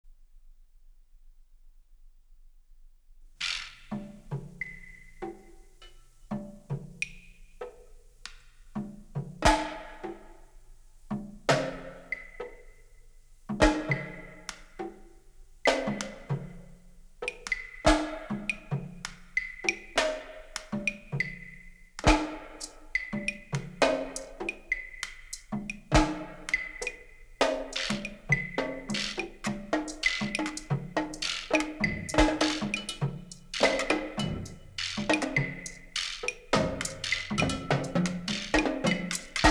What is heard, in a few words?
Concert Percussion for Orchestra